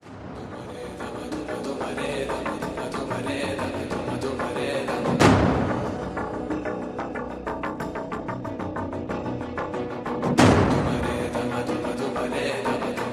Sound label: Inspiring, Epic BGM